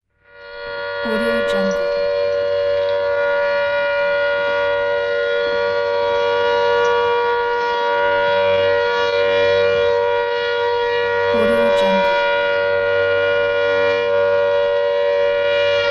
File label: war alarm